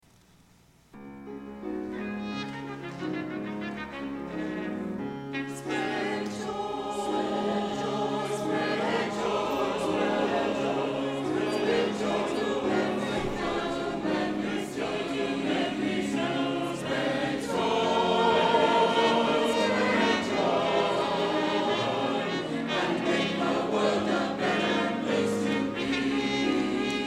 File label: Anthem Chancel Choir